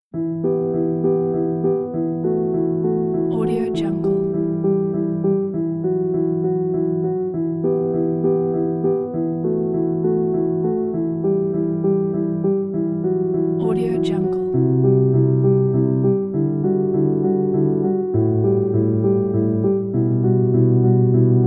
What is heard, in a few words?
sad documentary piano